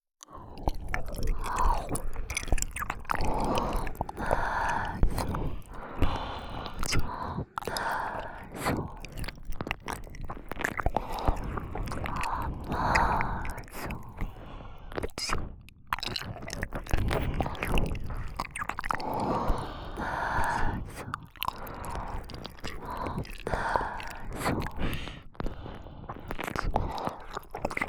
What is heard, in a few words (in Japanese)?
耳舐め囁き マゾ